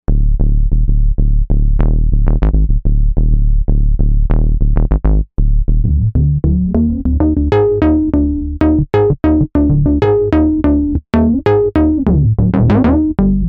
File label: Windmill Bass, Novation UltraNova; ChuckieSoundpack